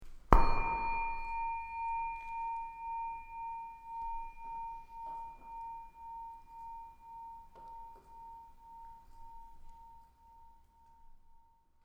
bol tibetain 10.5cm; bouddha

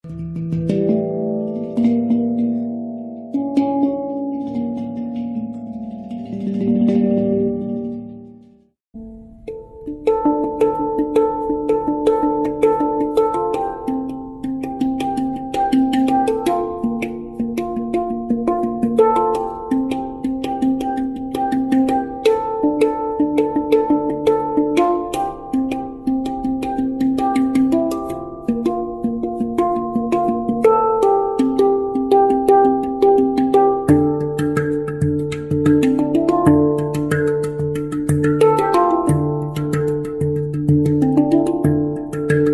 handpan 9 notes cuivre

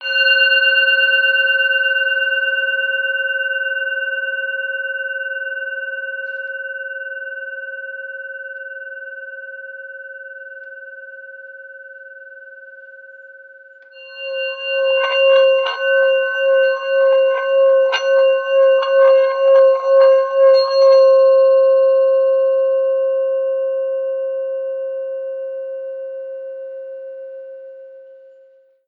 Bol Tibetain Sacre Martele a la Main; 12cm

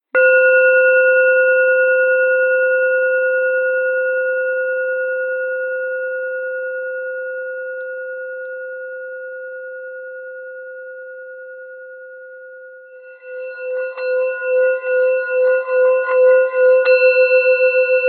Bol Chantant Tibetain Himalaya

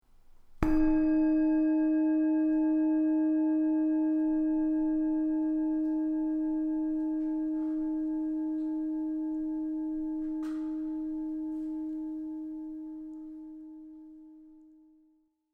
bol mantra dore; monboltibetain

